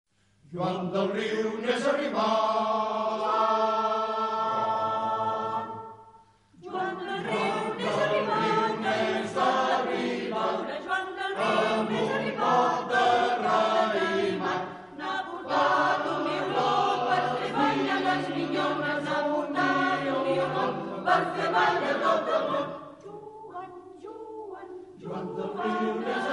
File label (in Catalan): coral; 4a Bienal Coro Mixto Pena Santa